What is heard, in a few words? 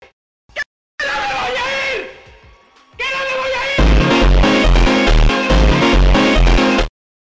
phonk